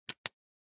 Cell Phone Hardware (Earphones Plug In)

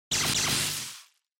Electric Shock, Mid

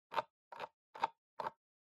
PC Mouse Scroll Fast

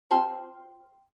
OS Sound (Speaker-Notification)

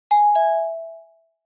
Doorbell Fake, Close; ドアベル フェイク; 近い

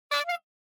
Bicycle Horn

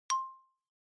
Xylophone, Single

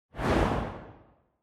Camera Motion Dry; シーン・カメラモーション

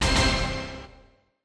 Quiz Question

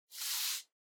Hand Foley Stroke

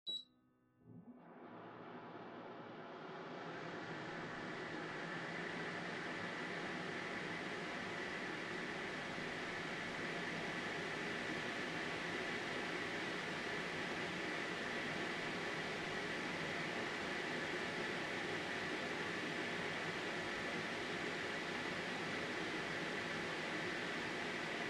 Air Purifier Far High

